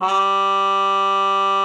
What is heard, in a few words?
TRUMPET, G3; Best Service ProSamples vol.42 - Session Instruments; PS-42 Session Instruments